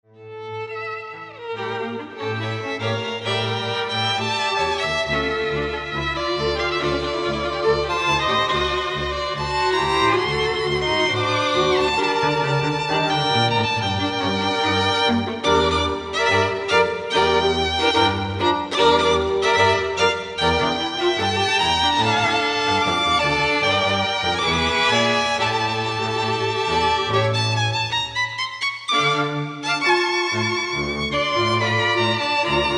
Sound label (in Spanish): tangos